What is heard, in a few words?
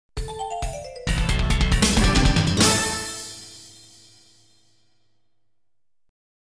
Logo (Arcade Version); ADK